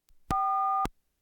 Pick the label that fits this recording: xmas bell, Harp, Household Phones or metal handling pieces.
Household Phones